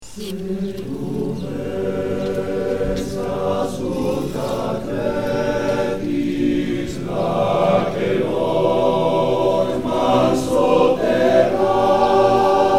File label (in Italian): coro bird; barga coro alpi apuane